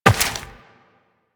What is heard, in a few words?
Step Rock, Footsteps Walk; Warframe; Tenno